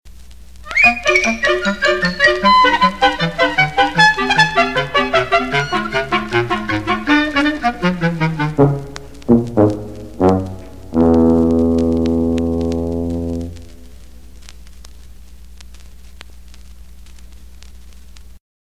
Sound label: Bridge, Cartoon Music; Cartoon Music Discs